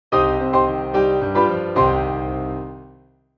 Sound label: moll